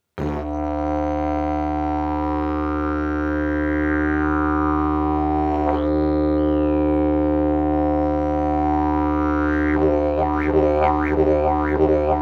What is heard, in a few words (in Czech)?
jilm klokan, didgeridoo